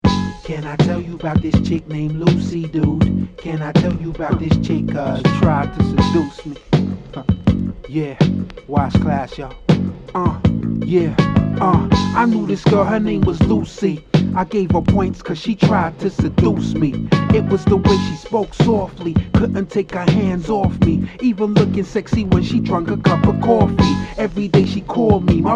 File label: funky